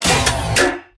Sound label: button; doors